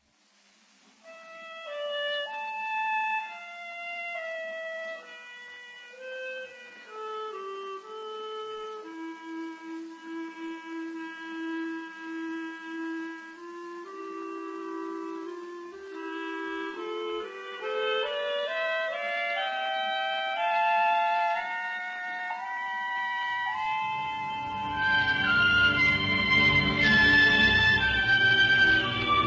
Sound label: Piano Concerto in C Major, Andante Allegro; Prokofiev; Piano Concerto No. 3